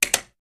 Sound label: Switches Industrial